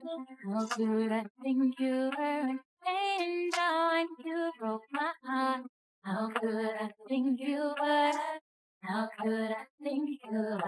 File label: vocals